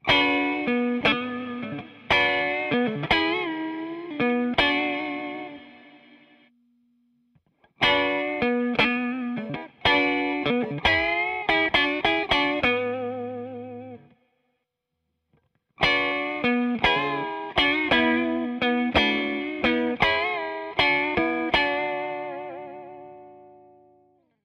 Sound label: plate, reverb; tc electronic nova